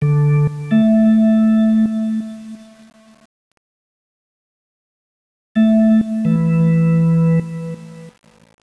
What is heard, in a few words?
B3 Rich Organ